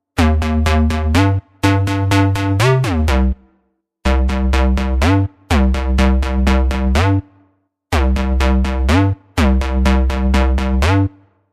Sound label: just add bass Reprise